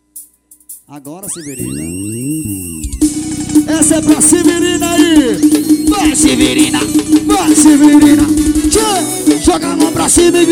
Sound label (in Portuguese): ao vivo medios graves; by nailton cds